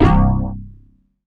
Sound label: Single Acoustic Basses